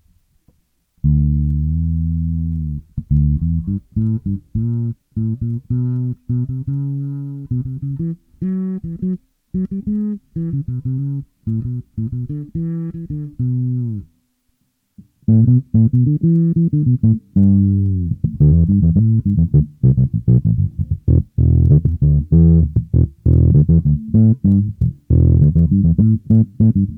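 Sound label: Vintage JB Bass, bass; electronics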